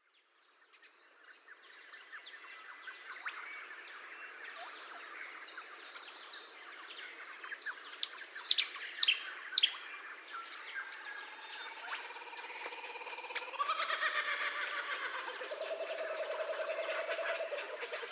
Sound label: australia soundscape; myro the musical